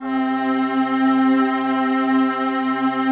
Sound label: PAD, DIGI-PADS